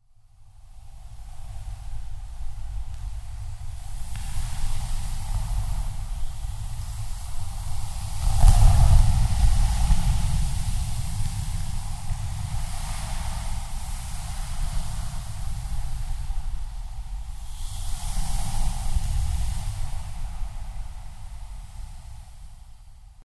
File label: Ocean breeze